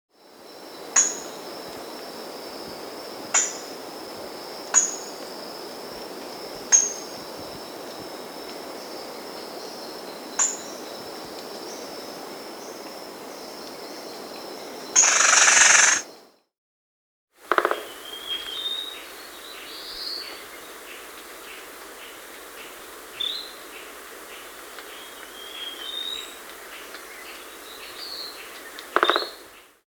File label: Crimson-Bellied Woodpecker (Campephilus Haematogaster), Andean Birds; S. America